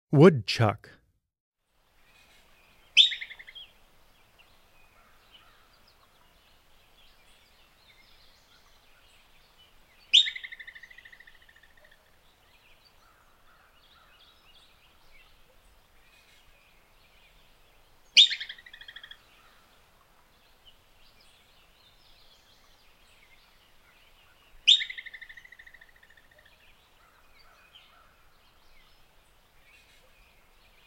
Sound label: Woodchuck, Animals Wildlife Sounds